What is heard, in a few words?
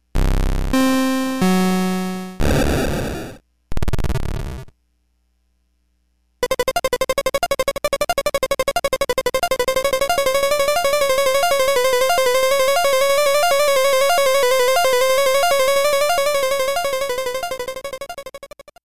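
crappy synth test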